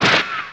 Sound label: Menu Exit; Menu FX